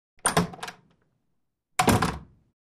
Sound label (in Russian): Открыли и закрыли дверь в кабинет, Opened and closed the door to the office